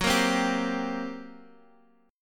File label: G Minor Major 7th Double Flat 5th, Gb